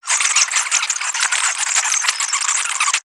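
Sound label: Pets Insect Large Rage; creatures; Lego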